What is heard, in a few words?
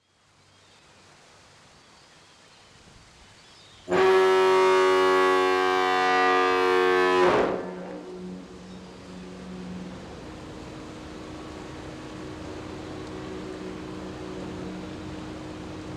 duluth foghorn